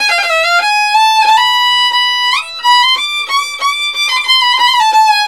VIOLIN, Balkanish Traditions

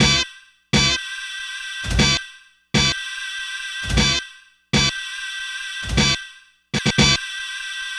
Horn, Keyboards; Horns

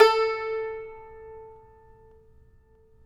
tar; Roland - LA Composer vol. 3

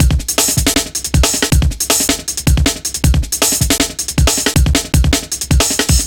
breaks, Loops

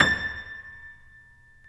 STEIN A5, STEINWAY GRD; Greytsounds VOL-1

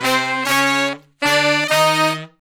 Horn Section

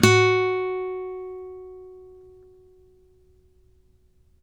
acoustic F#3 L, guitar; East West; Steve Stevens Guitar Samples Collection; ACOUSTIC PCK

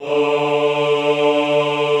AHH D2, Classical Choir; Best Service; Extended Classical Choir

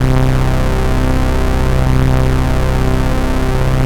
MONO POLY, Bass

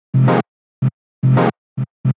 110 Fm, Melodic Loops; M8; Electronisounds

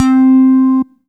NINTY BASS C5; Q Up Arts; Dream Experience

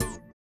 VIBRA; Spectrasonics; Metamorphosis

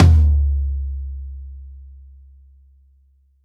FLOOR TOM, DRUMS ONE SHOTS; BASEMENT DRUMS VOL 2

